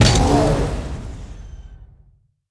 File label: car jump